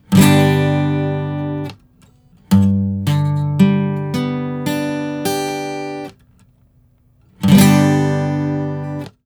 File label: Major or Minor